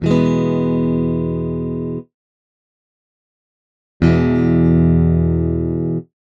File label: guitar velocity